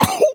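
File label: small grunt, voice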